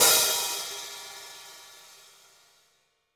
hihat - opened; JazzFunkKit

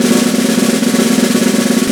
SNR SNR, Orch Snares; Roland LCDP03 Orchestral Perc

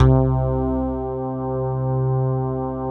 HYBRID BASS; Greytsounds; Scott Peers Sound Engineering

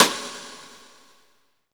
SNR, Roland, Reverbs; Club-50 - Foundations Roland; Verb 4

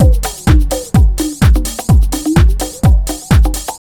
BEAT, Disco House; USB Soundscan vol.20; AKAI

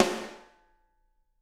SD, Drums; Bob Clearmountain Drums I

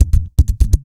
LATIN LIPS, Vocal Blues Soul; Spectrasonics; Vocal Planet

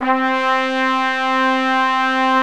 SYMPHONIC BRS

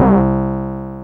D GUIT LO; Back In Time Records; Big Bang; THE ROCK

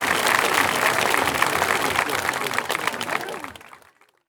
Crowd Effects

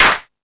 Crash, Drum Machines E-MU Pro-Cussion

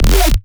bass hit aggro whomp; Virtual Riot

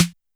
Snare Analog, YAMAHA RM1X

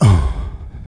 vox, Human Perc; Spectrasonics; Supreme Beats; World Dance

